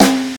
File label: sharp snare c key, Loudest frequency: 1729Hz